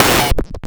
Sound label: FM Slap, Cwejman S1